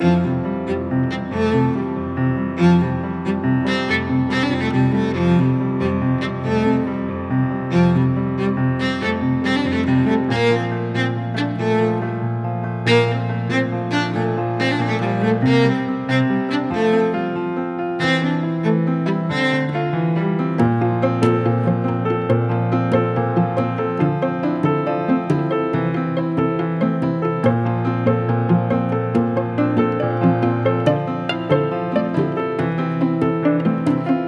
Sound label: Music Level Inspiration